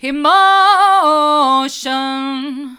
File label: emotion hard, vocal